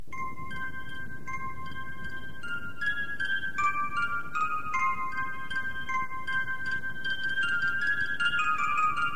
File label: Sweet Little Chime